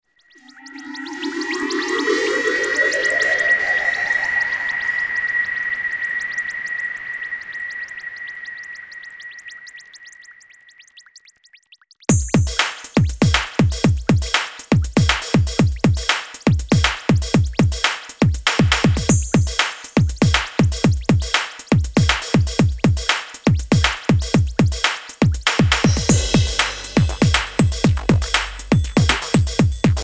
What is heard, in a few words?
flying UFO, off melody